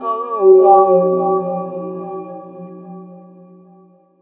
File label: OH HO OO, Funky Vocals, ADLIB WOM; AKAI; USB Soundscan vol.18